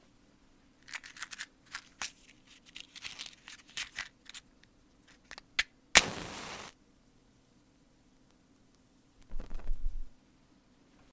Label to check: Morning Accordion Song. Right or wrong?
Wrong